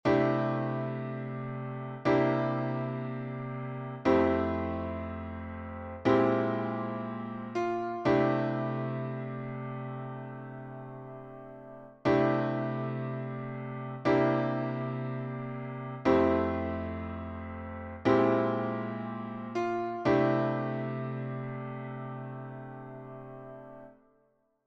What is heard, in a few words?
IV - bVII - I, gospel piano; IV-(bVII7)-I; cadence modale; Enchainement IV-I; L'enchainement bVII7-I ( ou ii-bVII7-I ) est une cadence modale ( on fait appel à un autre mode sur le bVII ); Enchainements d'Accords